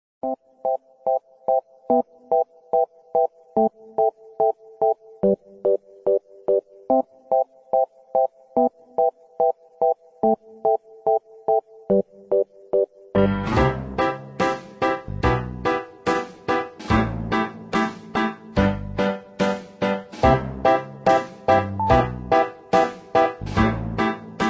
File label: instrumental